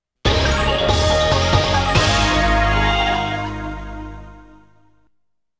パターンクリアBGM; konami amusement sounds 93 summer

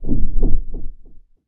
心臓; misao

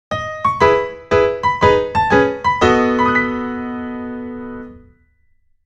ジングル; A Synopsis of Girls Who Don't Flatter Me. Original Soundtrack; Kobinai Kanojo no Shohousen

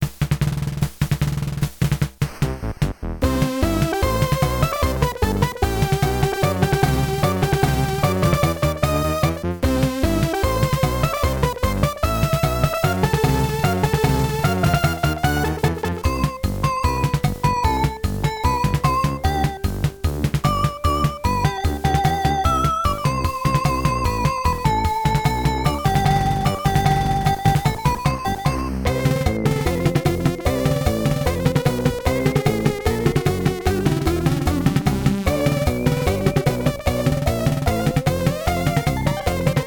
スタッフロール FM音源版; fray msx remastered soundtracks 2008

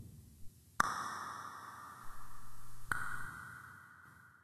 雫; misao

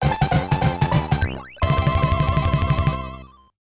イベント FM音源版; fray msx remastered soundtracks 2008

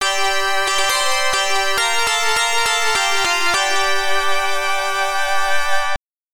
レベルアップ; Noble Mind; X68000

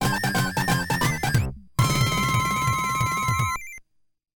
ジングル; fray pc-9801 soundtracks 2007

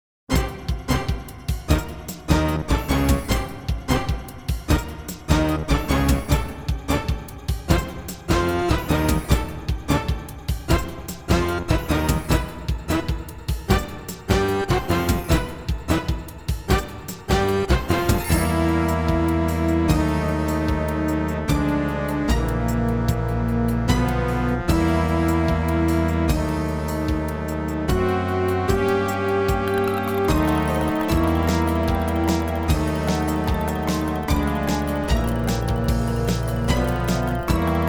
WATER パイプ; All Sounds of Illusion City

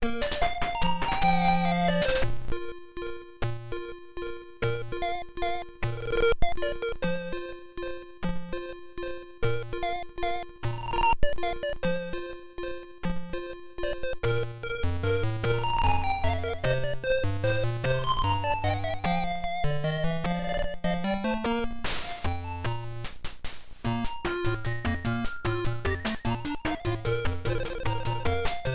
村／町 PSG版; fray msx remastered soundtracks 2008

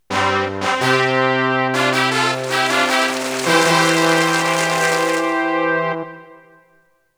ファンファーレ; Alvaleak Boukenki; PC-9801

